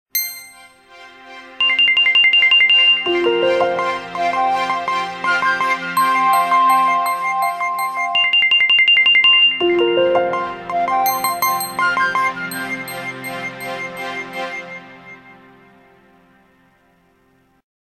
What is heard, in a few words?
Calm; Nokia; Nokia Tones; Windows Phone apps